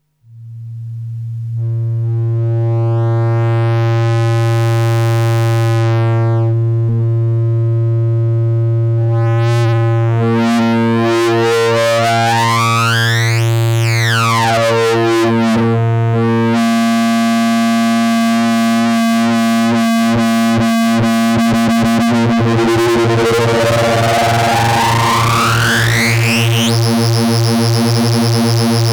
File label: Distorted Filter; Behringer 2600